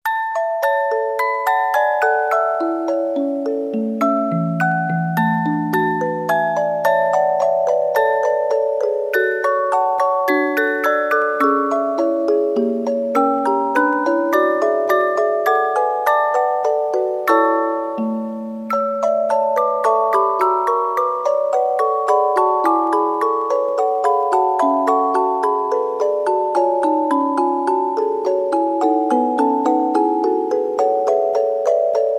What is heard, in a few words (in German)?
Spieluhren, Weihnachten; Ding Dong